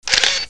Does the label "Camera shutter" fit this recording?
Yes